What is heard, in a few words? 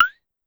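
Claves Up, Drum Machines Clavia DDrum-AT Pitch Envelope; Pitch Env Various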